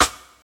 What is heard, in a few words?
good acoustic snare sound d key